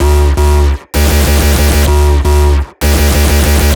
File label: bass 128 D; Electro; bass loops; Anthemic